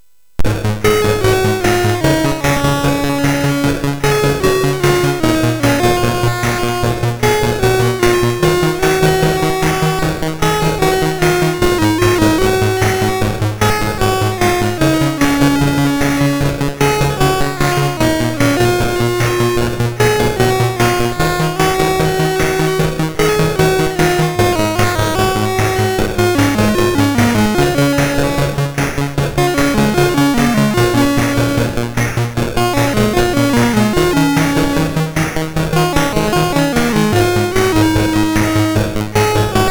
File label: Synth Sample, MOS6581R3; hvsc